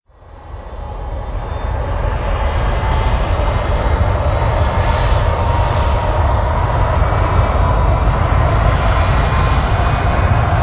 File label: Airplane Engines